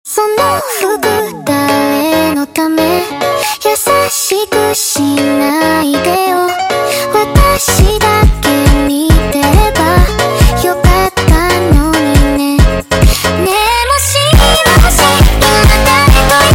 Montagem sped Up

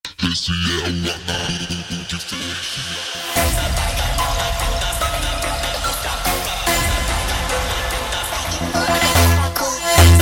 Montagem Sped Up

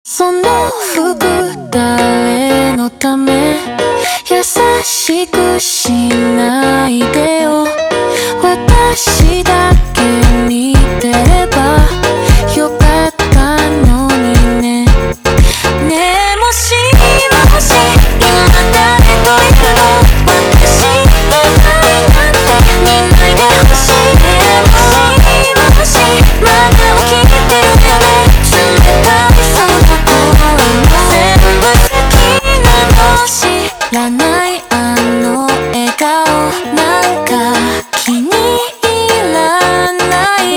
Montagem slowed

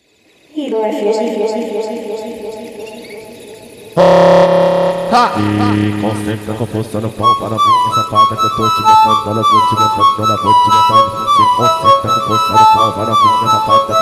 Montagem

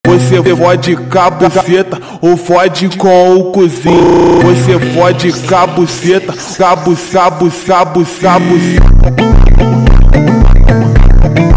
Funk slowed

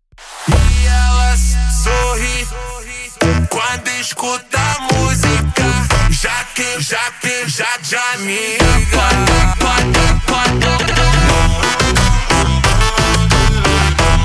Montagem slowed

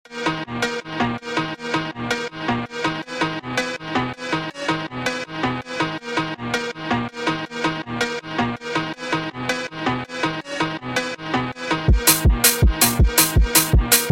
Phonk Remix speedup

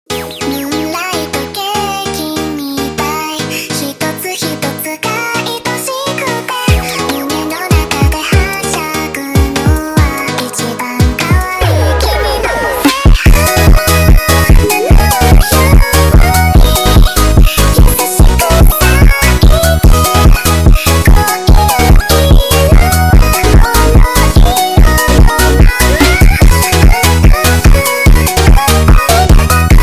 Montagem sped Up

